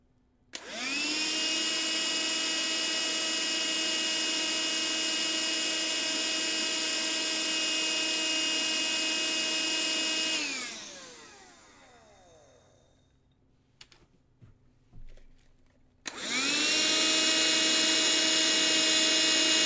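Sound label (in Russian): пылесос портативный. пылесос с включенным питанием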